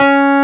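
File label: WURLY NO, Wurlitzer electric piano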